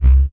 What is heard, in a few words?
Footsteps; unreal development kit